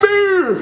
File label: knife kill; flash new knife